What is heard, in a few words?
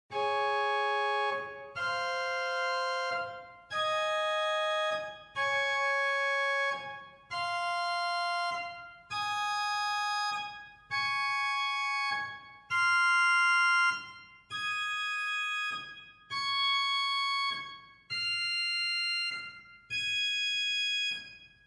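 Mixtur arpeggio, organ; Reinhardtsgrimma; typical Silbermann middle-sized church organ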